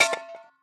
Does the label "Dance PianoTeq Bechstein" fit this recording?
No